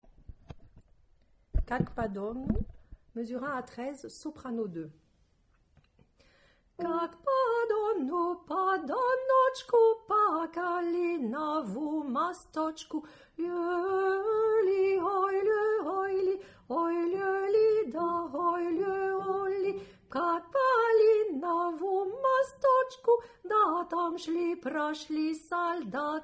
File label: Soprano2